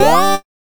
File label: Battery; Robot 64